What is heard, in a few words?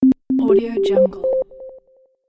level up; dulebas